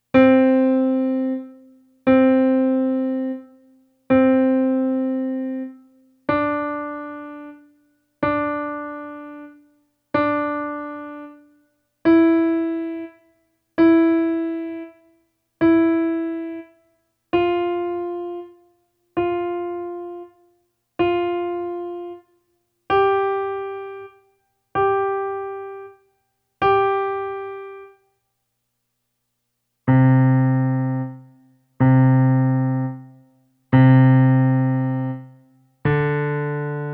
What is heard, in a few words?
5 Finger Exercise